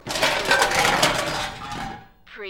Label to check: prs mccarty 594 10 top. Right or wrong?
Wrong